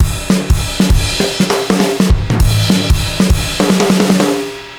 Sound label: Britbeat; Ударные и барабаны (Рок, Поп); Rock & Pop; Brit Beat D